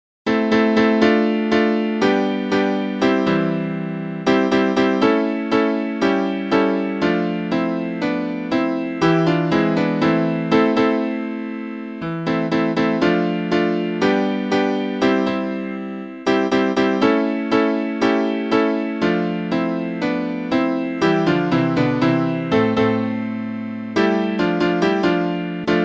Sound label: midi SATB